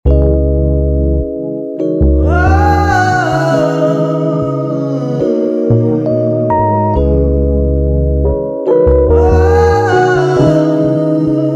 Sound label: C m 140bpm